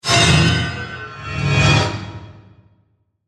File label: Hit To Whoosh; Metal, Horror, Production Element, Imaging Element, Accent, Transition; BlastWave